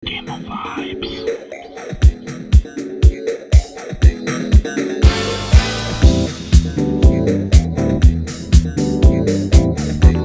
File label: Discojingle; Demovibes